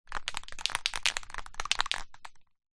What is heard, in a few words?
industrial spray can marble shake